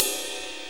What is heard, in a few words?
Sweet Ride, Cymbals; Sonic Foundry (Sony Creative Software); Drum Tools